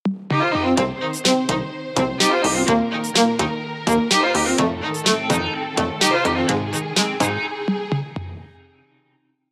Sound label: Strings, ringtone